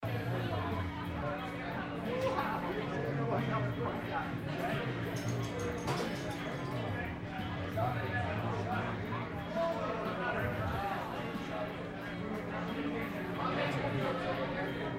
Opening Pub

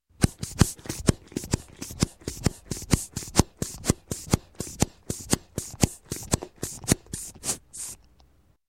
Hospital Blood Pressure Tester Pumping Up; Sound Ideas 2000 Series

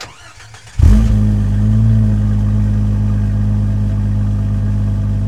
Jeep Wrangler Start Idle Loop; Trucks

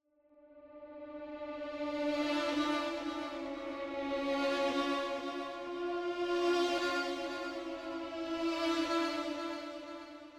Symphonic Hit Dramatic Violin Tones - Echo; Hollywood Edge; sound designer's toolkit v2

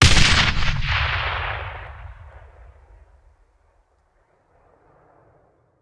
Grenade Hand; Sound Ideas; Guns

